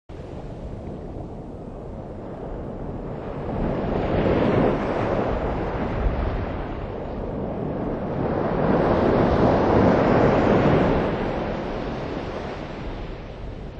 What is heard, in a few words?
ocean